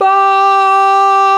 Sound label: VOX, Male Scats